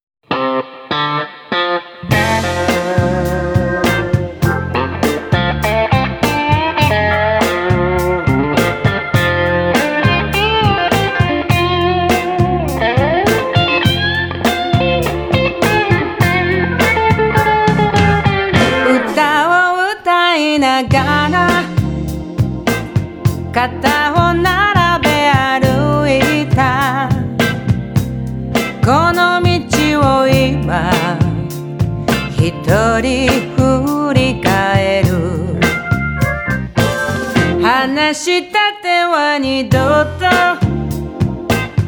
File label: While singing a song